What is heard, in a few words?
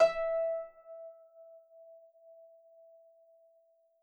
E4 Harp